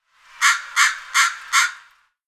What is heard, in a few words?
AMCR call